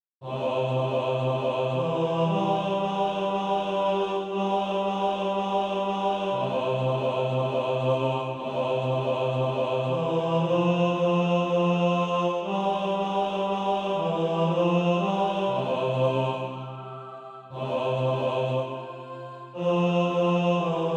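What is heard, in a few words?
tenor, SATB Practise Music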